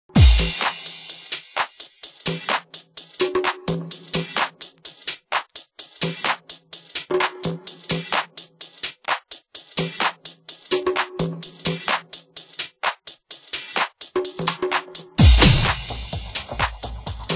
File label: Drum Dub